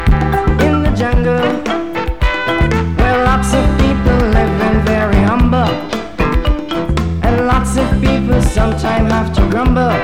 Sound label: Jungle